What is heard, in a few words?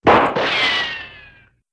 Ricochet Shot, bullet bys & riccos; Ricochet with shot